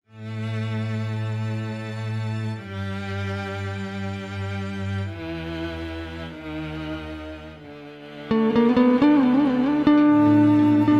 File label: Symphony in Raga Kanakangi